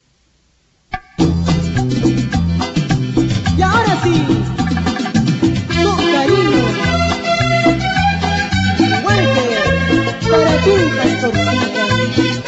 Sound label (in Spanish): cumbia peruana